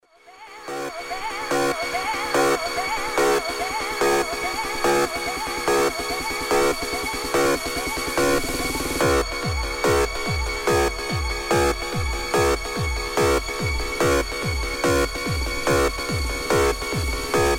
Alarm New

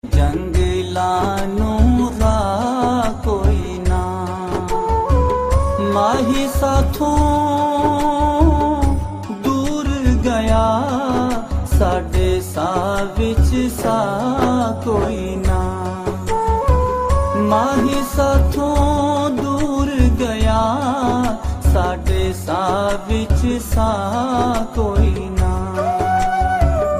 Islami

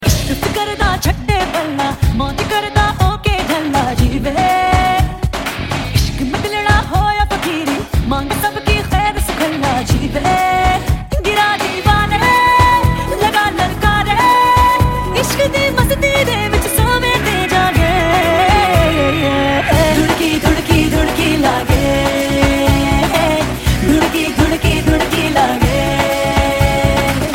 Dhunki Beats

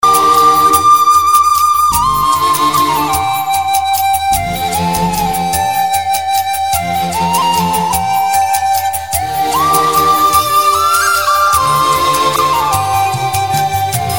Romantic Musical

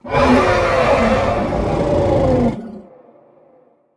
Dragon

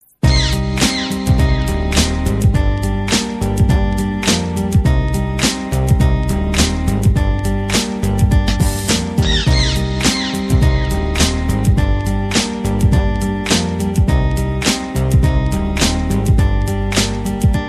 Hip Hop; Montenegro

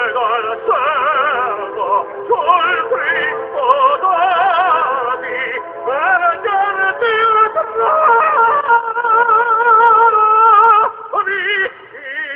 Tenor Fail

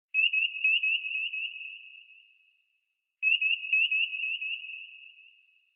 Ping; Star Trek

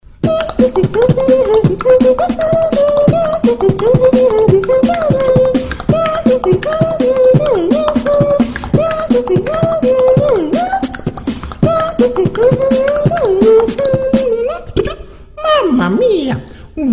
Beat Box

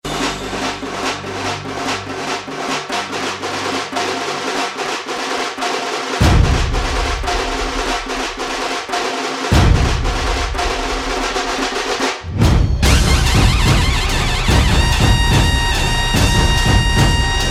Dhol Tasha

